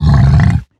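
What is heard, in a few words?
hoglin angry, 疣猪兽：怒吼; Minecraft; 我的世界; 疣猪兽是一种生成在绯红森林的攻击型生物。它们是目前唯一可繁殖的攻击型生物。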